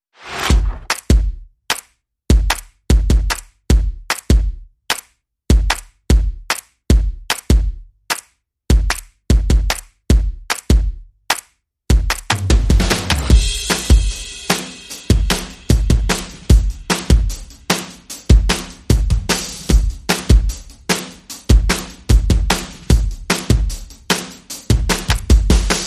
Only Drums

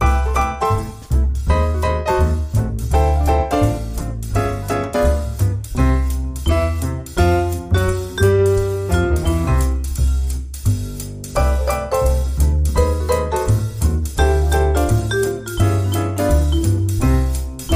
Swing

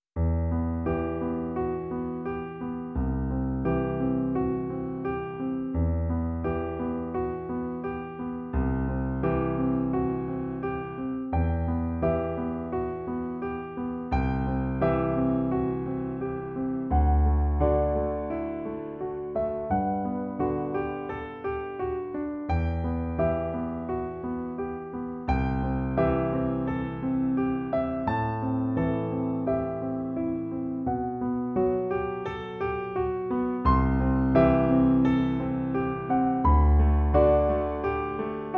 Piano Version

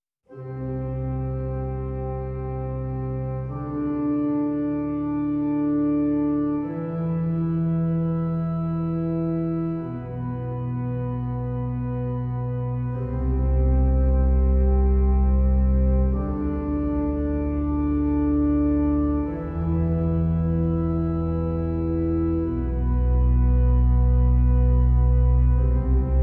Drums and Bass Mix

